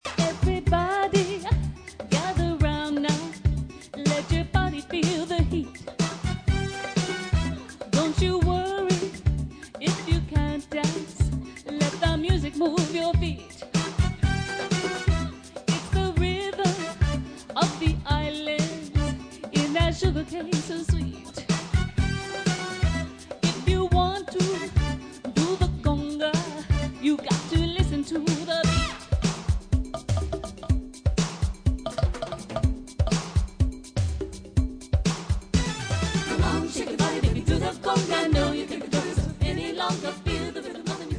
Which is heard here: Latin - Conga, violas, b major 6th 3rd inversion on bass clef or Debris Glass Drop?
Latin - Conga